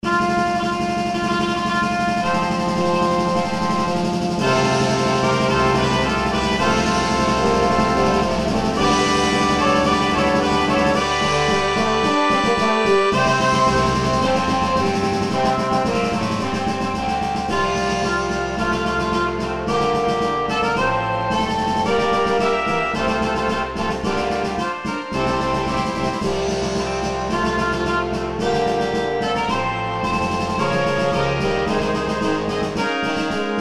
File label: Fanfare & Intro, Brass; Les Deutsch; Brass, Organ & Percussion